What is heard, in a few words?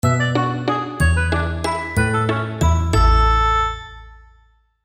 nokia tune